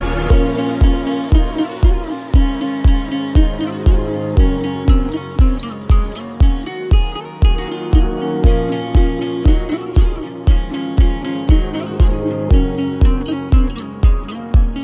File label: instrumenta